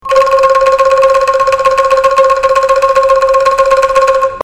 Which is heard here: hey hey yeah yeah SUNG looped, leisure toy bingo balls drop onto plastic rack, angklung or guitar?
angklung